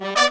100, BRASS SAMBA

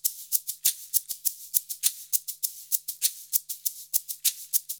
Modern Percussion Loops; Soundscan vol.56; AKAI

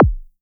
KCK, Roland SH-09 synth drums

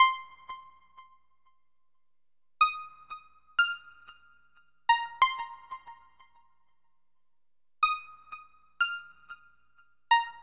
syn Ab, Hip Hop & RnB; Tantrum

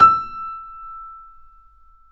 Mf E 2, Grand Piano off mic; AKAI S6000 CD-ROM - Volume 2

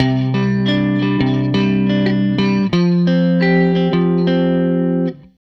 Tele Guitar Loop

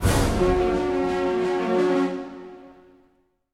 Orchestral Loops; 128 MANHATTAN

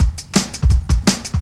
Step Ez Cut, drum loop, jungle, 168.5

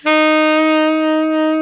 TENOR SAX D#63; Northstar - New Gold VOL-1

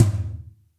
TOMS; Zero-G - Chemical Beats

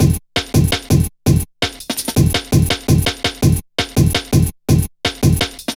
Played, Drum Loops 166.5; Zero-G Jungle Warfare 1, 2 & 3

